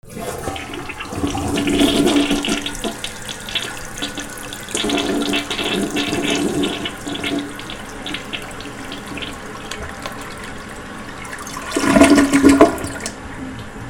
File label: BATHROOM